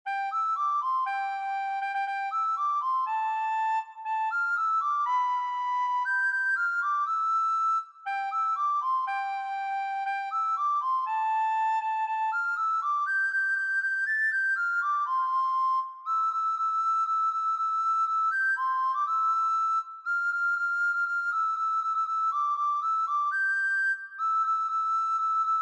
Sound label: Jingle Bells Recorder